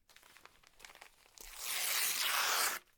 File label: paper rip short; sonniss gdc